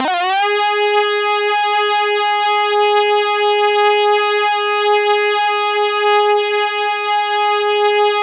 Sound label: C4, Synth Line; 90 s Sample CDs; NiceBeats; SynthLine VOL-24